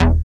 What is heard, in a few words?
SYN DANCE; Sound & Vision - Gigapack II